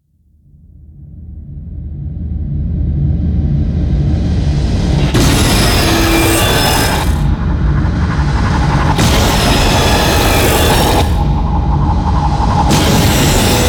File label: Alien Attack